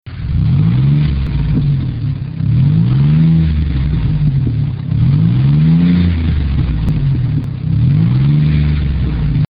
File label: Astro 4.3L Dual Sport